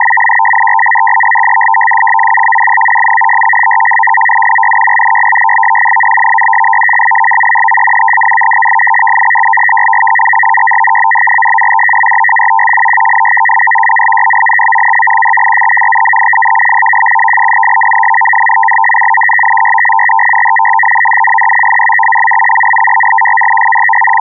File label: voynich FSK 44100Hz PCM